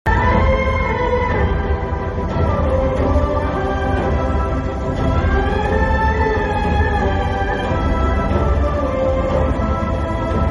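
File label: sad background music ringtone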